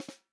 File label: snare drum, POP SNARE CHROMATIC